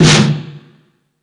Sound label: Percussion FX, E-MU Pro-Cussion